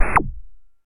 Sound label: Video Game Fire Effe